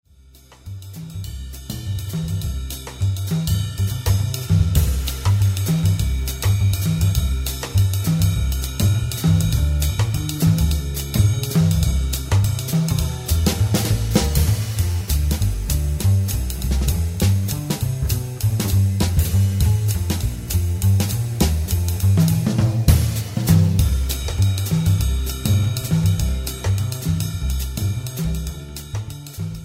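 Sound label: bs dr, bass drums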